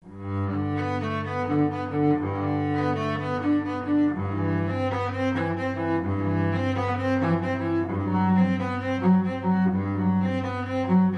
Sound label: strings prelude; carnevalstrings